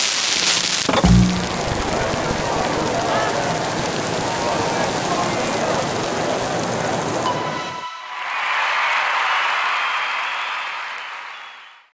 Gacha Shutdown

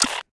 Click; Subway Surfers City Sound Effects; Android

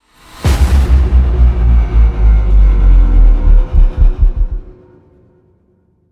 Stinger Modulate

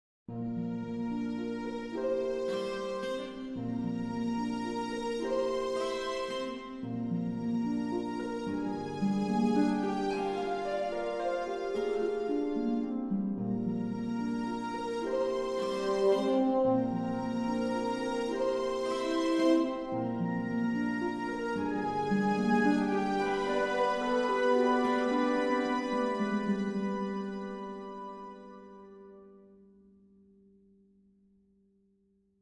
Cinematic

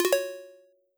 Picked Coin Echo; malware; (Windows) (gamerip) (2024)

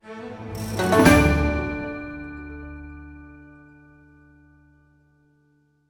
stinger Chest Opened

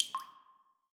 Water Drop

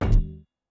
Armor Hit

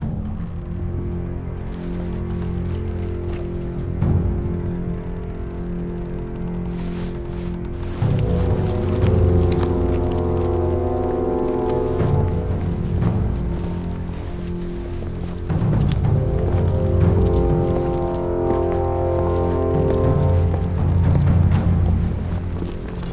boss persian

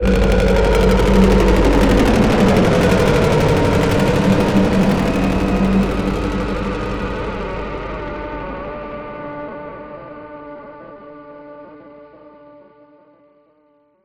Helicopter Fight Start

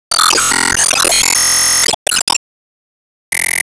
League Rankup Reward; disney star smash android ios mobile gamerip 2020

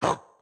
Chop Attack; Going Under; Caffiend Caelan

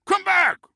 Guard Start Run Stumble Come Back; Subway Surfers City; Android, iOS

